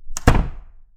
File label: Door Close